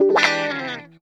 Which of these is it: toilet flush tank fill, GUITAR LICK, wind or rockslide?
GUITAR LICK